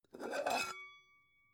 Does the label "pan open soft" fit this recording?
Yes